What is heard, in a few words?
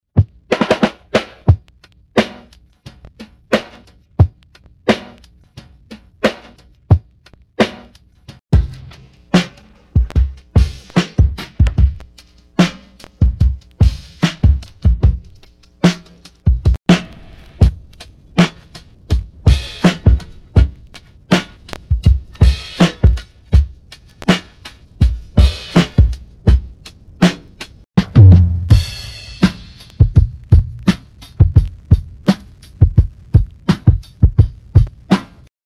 Breakbeat; Extrait